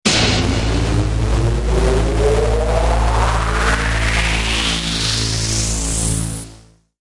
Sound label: Fired Up Alert Tone